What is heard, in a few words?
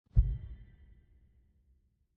Desert mushroom Bounce; Desert SFX